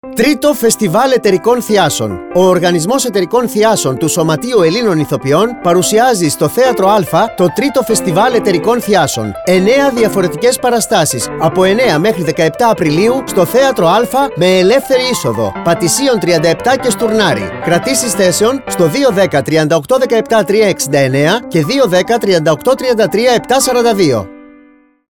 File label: theatro music